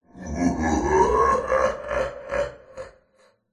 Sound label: horror demon devil evil laugh processed; zapsplat